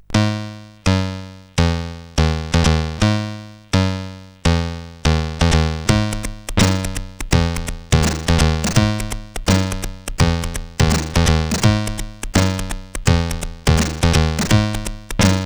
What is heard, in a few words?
CSG8580